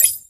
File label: Coin count